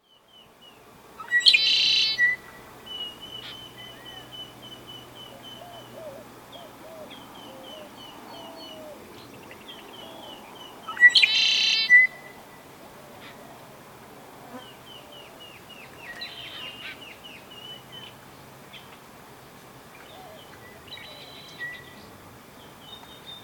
Red winged Blackbird Agelaius phoeniceus

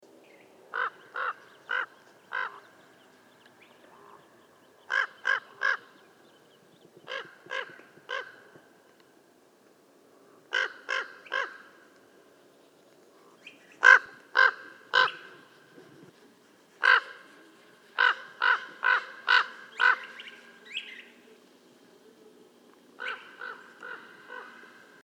Northern Raven Corvus corax sinuatus

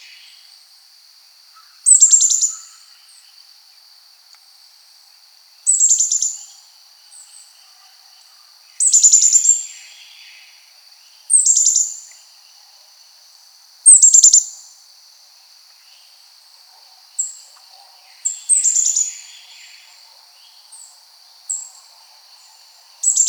Carolina Chickadee Poecile carolinensis

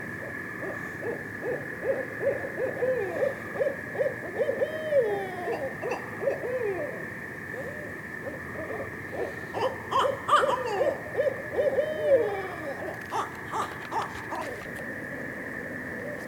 BDOW family duetting pair gurgles caterwauling; Trempealeau river bridge

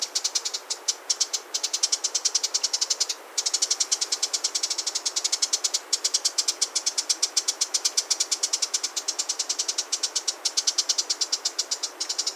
Ruby crowned Kinglet Regulus calendula

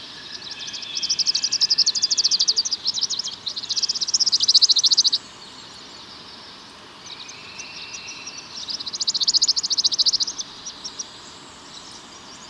Palm Warbler Setophaga palmarum

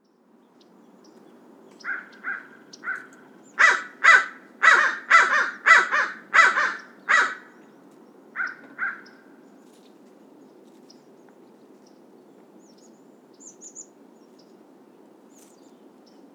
American Crow Corvus brachyrhynchos

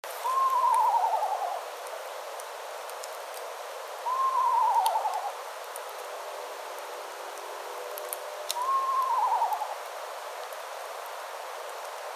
Eastern Screech Owl

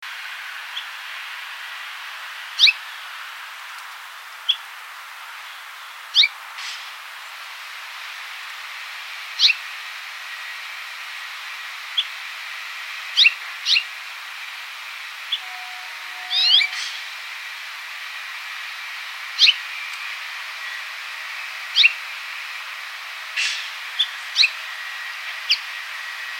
House Finch Haemorhous mexicanus

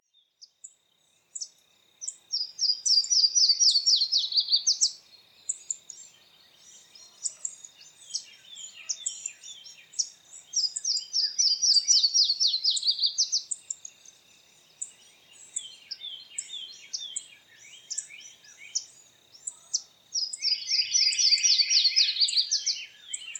Yellow throated Warbler Setophaga dominica

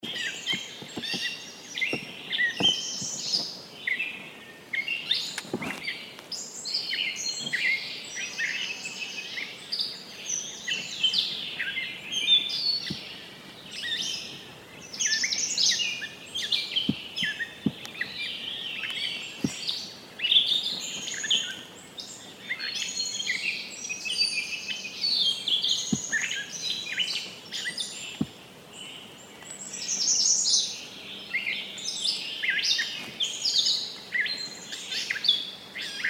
Grey Catbird Dumetella carolinensis

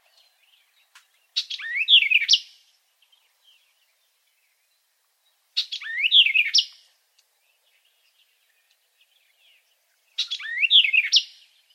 White eyed Vireo Vireo griseus

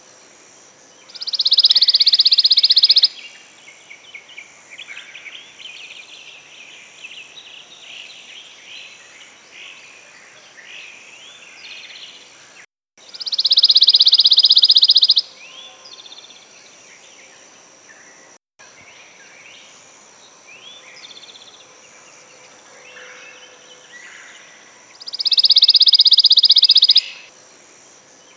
Pine Warbler Setophaga pinus

